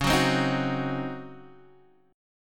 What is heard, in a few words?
Db Major 7th Suspended 2nd Suspended 4th Db Guitar DADGAD